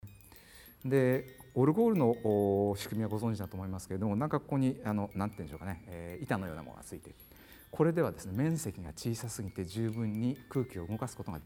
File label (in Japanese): オルゴール